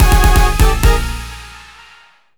MAP NEW CHALLENGE; maimai

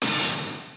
stab

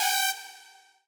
synth

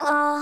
Bass D4; 音MAD

